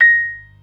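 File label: PERC D sharp 3; Best Service; Advanced Media Trax Modern Composer; B3 FULL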